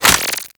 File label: Minigun Reload; Warframe; Corpus